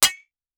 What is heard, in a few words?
METAL SNARE MINI; M8